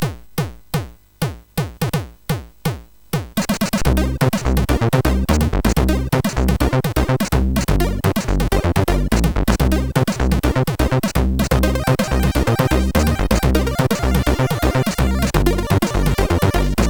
Intro sid MOS6581R4; hvsc

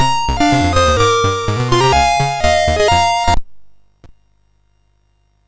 sid MOS6581R3, GAMES; Continental Circus

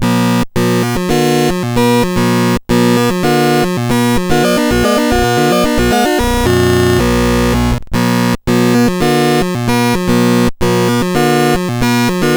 BASIC sid CSG8580R5

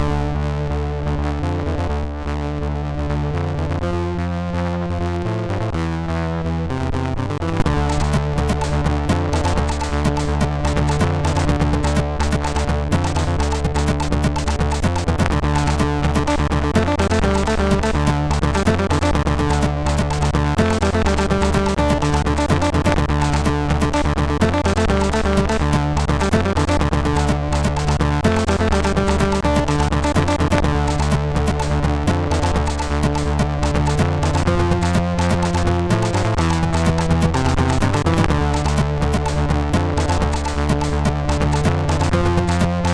sid, MOS6581R3

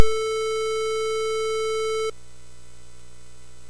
sid MOS6581R3; Pole Position

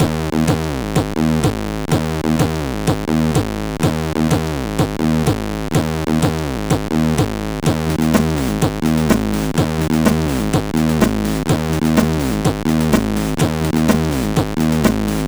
Techno House, sid MOS6581R4; hvsc

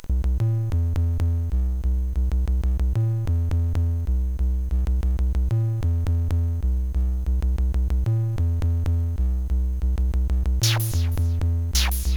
Drum n Bass, CSG8580R5; sid; hvsc

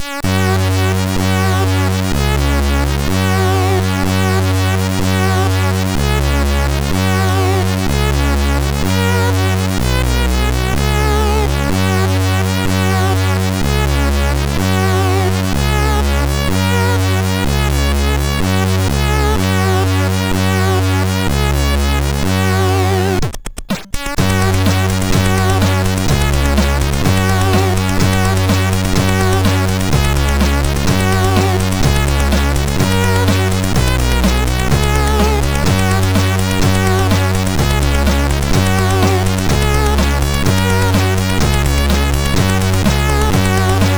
MOS6581R4, sid; Hitmachine

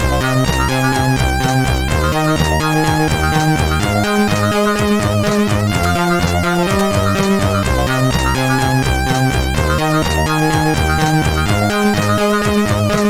Muecken Theme sid MOS6581R4

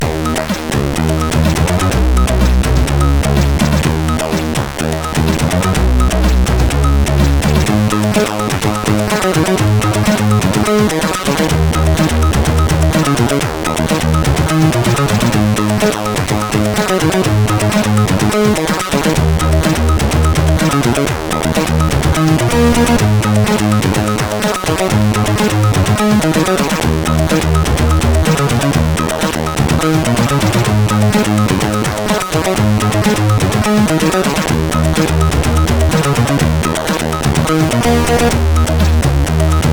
Remix, MOS6581R4

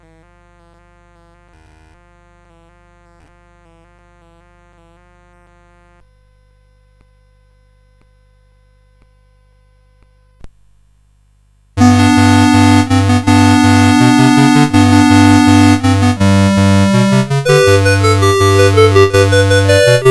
Squeeze Box B CSG8580R5

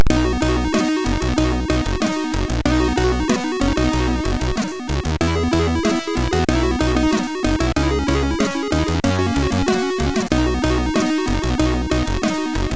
Jinglebells MOS6581R3; hvsc

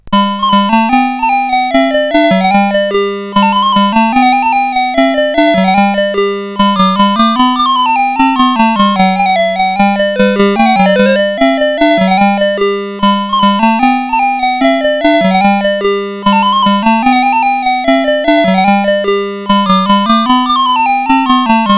sid CSG8580R5, GAMES; Cavelon

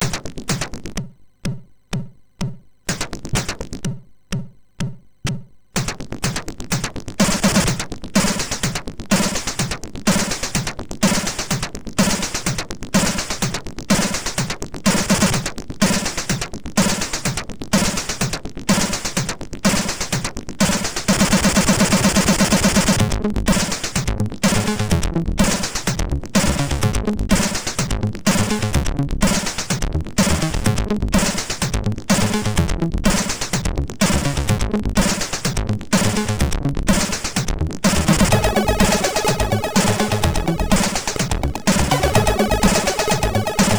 MOS6581; MOS6581R4

